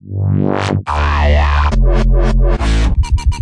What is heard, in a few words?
140 dubstep aya wokka bass, BASS LOOPS